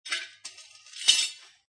Falling Tools, A long metal tool sliding on a table, falling off, hit floor; impact with a ringing sound; Clangs; Lynne Music